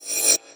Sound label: Messagebox In